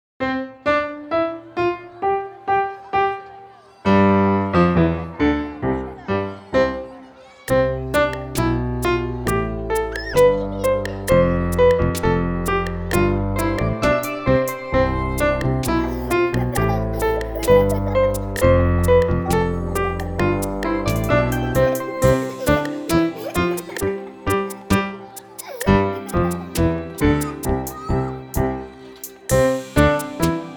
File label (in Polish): wersja instrumentalna; EduMuzArte